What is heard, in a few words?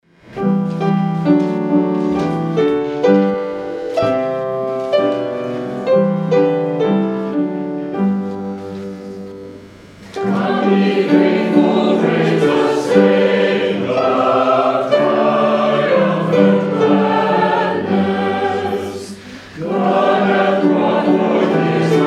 hymn; Come, Ye Faithful, Raise the Strain; AVE VIRGO VIRGINUM